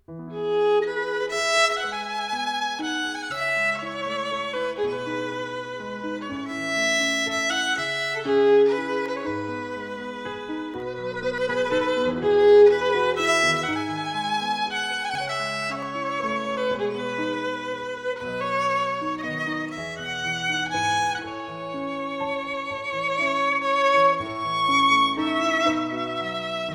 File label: instrumental